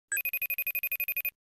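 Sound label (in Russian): звуки операционной системы mac os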